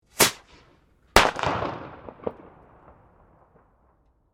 Fire, Firework, Bottle, Rocket; Backgrounds

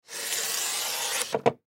Paper Cutter Cut Paper; Office; Blastwave

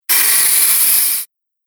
Sharpening Swish Sound, whoosh; Andrew Fleming

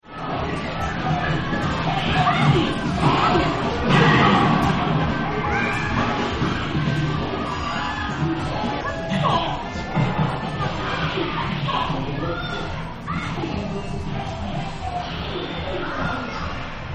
Ambient Sound Of A Small Video Game Arcade; Arcades; Games

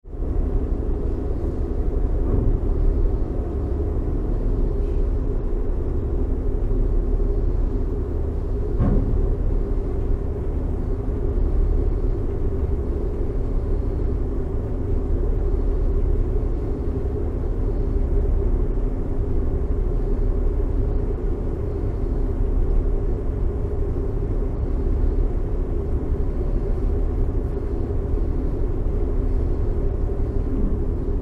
Ship Large Ferry Cabin Atmosphere; Tom Hutchings

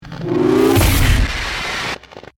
Power Up Hit Static, Stutter; Production Element, Imaging Element, Accent, Transition; BlastWave

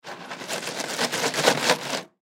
Ice Bucket Shake Rattle Full; Hotel Room; Foley; Drink Mixing; Blastwave

